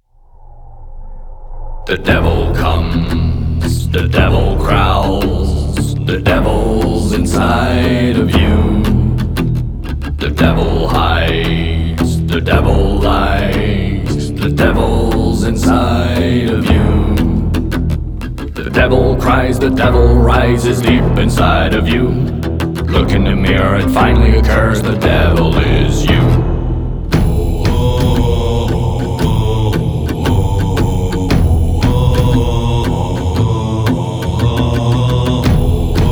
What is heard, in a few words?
acoustic